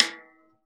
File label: RAW SMISS, Drums Acoustic; AKAI; 90s sample CD; USB Soundscan vol.10; RAW KIT 4